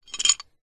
metal sequence slide gear change mechanical gear mechanism accent; ancient game